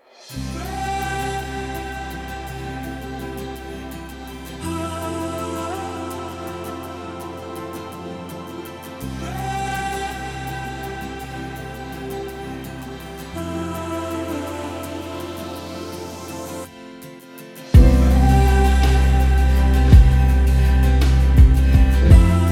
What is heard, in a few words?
harmony of heaven worship gospel christian church music